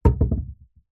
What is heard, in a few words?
leisure ball small hard drop on internal wooden floor